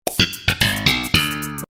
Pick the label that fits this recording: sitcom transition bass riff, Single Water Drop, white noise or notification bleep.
sitcom transition bass riff